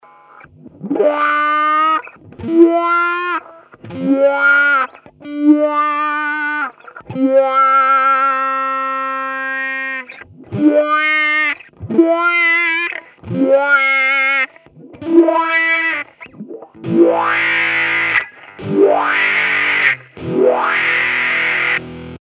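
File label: Slow filter, Line 6 M13 guitar processor; эффект смычка + автовау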